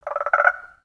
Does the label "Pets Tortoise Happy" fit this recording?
Yes